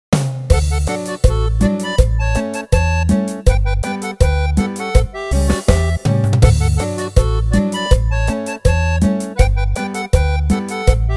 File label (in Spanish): Cumbia; Norteño